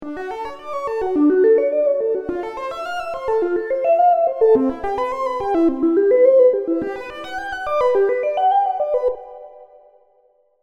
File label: serum seqs arp; chiqui audio